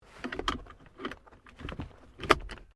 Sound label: Car Subaru Liberty Interior Seatbelt Buckle; Vehicles; Family members Subaru